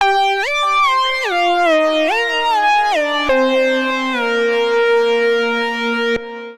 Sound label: Bristle Lead C Minor 73 bpm; MIDI Clips; Tonal; Melodic; Ableton; Factory Packs; Chop and Swing